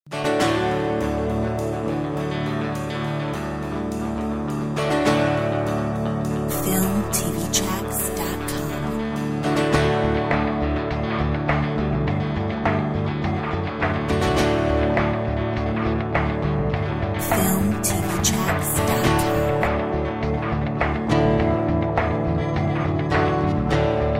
Rock breeze

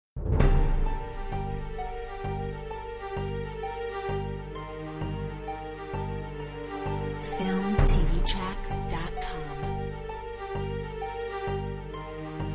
trailer

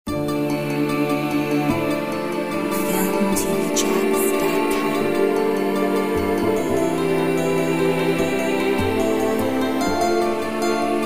Heroic times

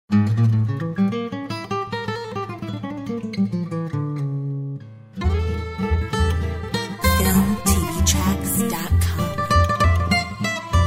Valse Manouche

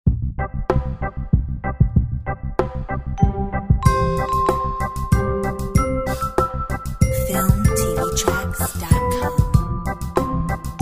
Tannenbaum reggae

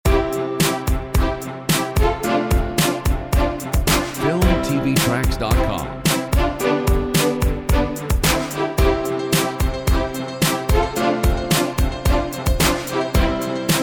Strings Celebration

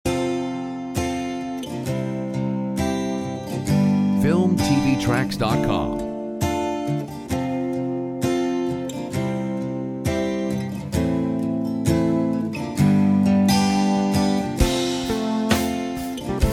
Midnight Bottle

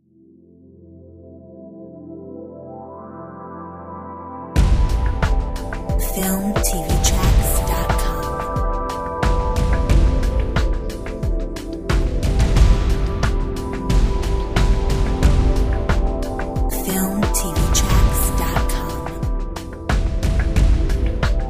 Ambient drums

